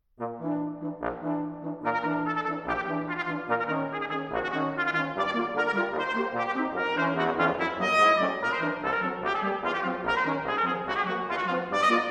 Quinteto Concertante Rondo

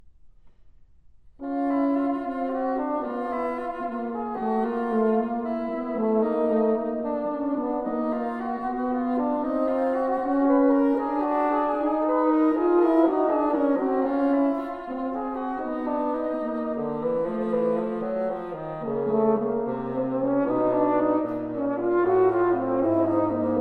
Duets For Horn & Bassoon; Twelve Duets For Horn & Bassoon - V.

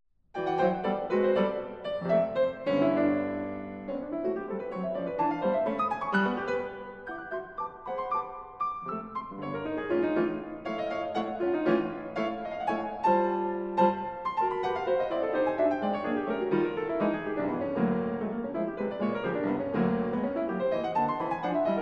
Piano Sonata, Allegro Moderato; 20th Century American Piano Music

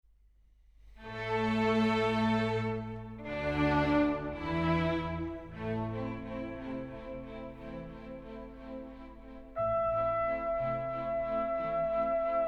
Concerto in E major, Andante

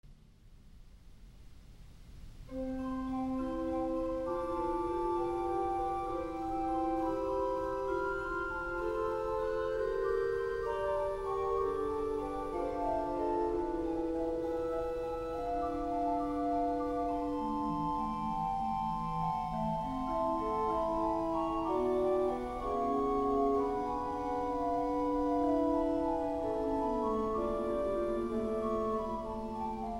Preludes, in C Major, Brass; Short Preludes For Organ - VI. No. 18

